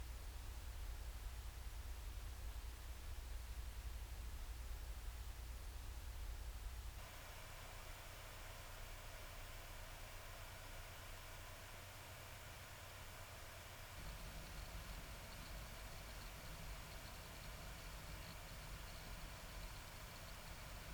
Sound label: wd blue sshd 4tb